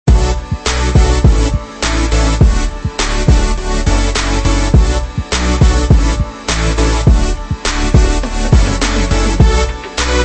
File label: Future Bass; Singular Sounds